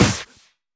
Snares; Vengeance - Essential Clubsounds Vol.4

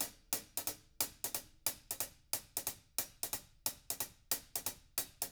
HIHAT LOOPS, Living Drums; Sampleheads; Peter Erskine

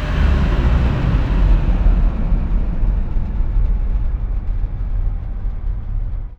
sfx sci fi; Masterbits - Switch FX

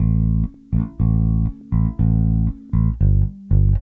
Bass, 70s Analog Funk

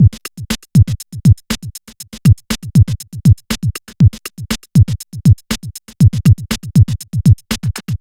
NRG Break, Trance, Drums, Breaks

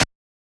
Yamaha DJX 2B, SD Elect.

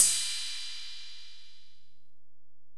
Ride, Alesis DM-PRO, Drum Machines

